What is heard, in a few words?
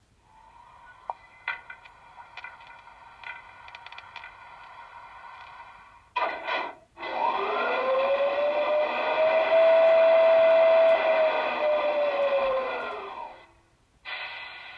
marklin artefact before first start